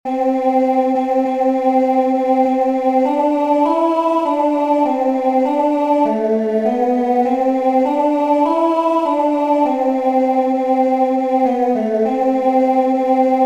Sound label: Fanfare